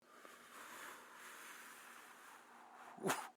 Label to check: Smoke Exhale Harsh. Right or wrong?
Right